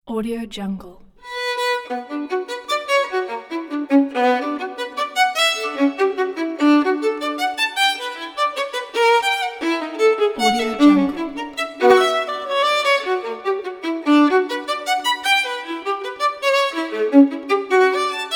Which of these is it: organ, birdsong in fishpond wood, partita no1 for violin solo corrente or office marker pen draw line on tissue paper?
partita no1 for violin solo corrente